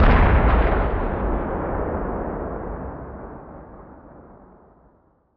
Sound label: distance boom; WAR